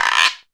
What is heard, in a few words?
technox perc; Quasimidi Technox